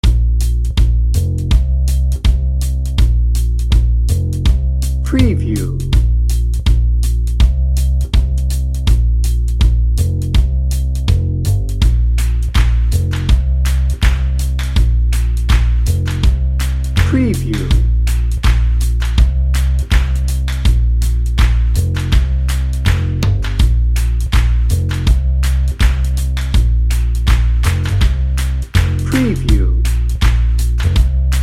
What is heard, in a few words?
rustic heartland